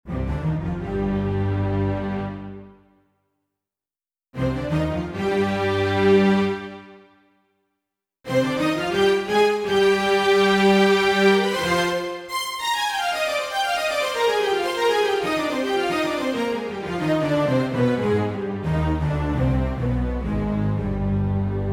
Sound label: bigstr; CMS Weihnachtskalender 2024